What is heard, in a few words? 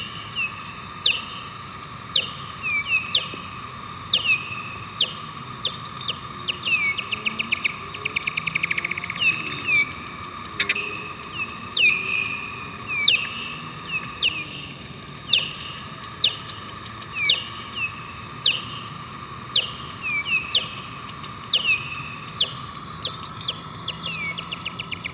Bat